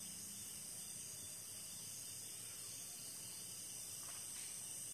Dark Mode Ambiance; burn the rope wiiware 2011 wii

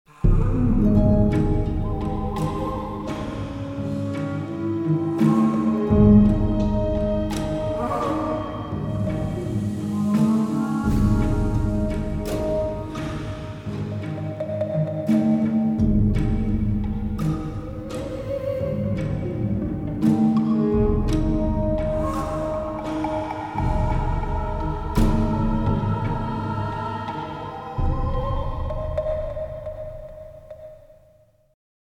Forest Walk

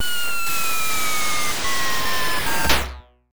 SFX (HOLE); dragon knight ii; pc 9801

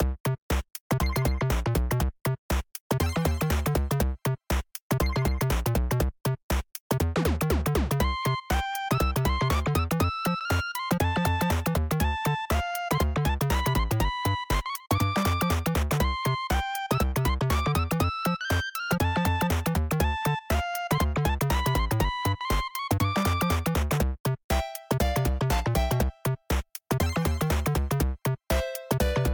Staff Roll; code shifter

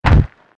walk dirt; Shattered Horizon

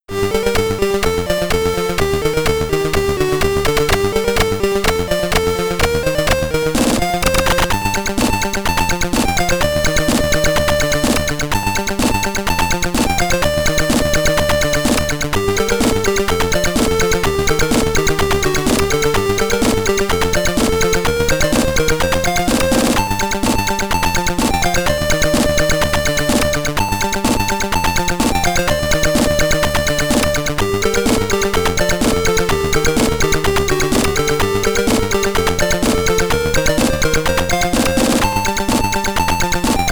SID Stereo, Alternate Drums; Arkanoid; Commodore 64

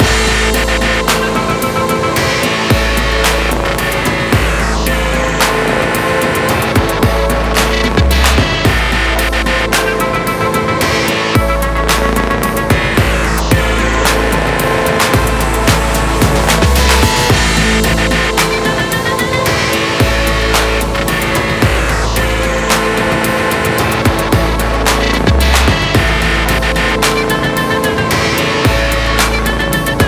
Story BGM Loop; Chrono Ark

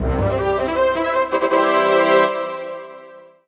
MUSIC EFFECT Orchestral Brass Positive stereo

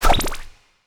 Transmission Gear; Yu-Gi-Oh! Master Duel

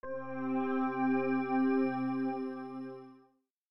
Goal Completed; Aenigma OS; Wii U

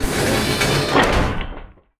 Ship Hatch

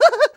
tail fire vocal; Scrat

